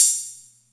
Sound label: Music Percussion Computer HH open, Drum Machines; Atlantex MPC-1